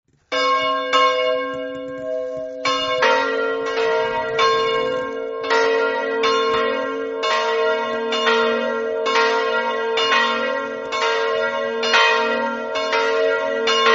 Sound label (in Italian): campane; roveredo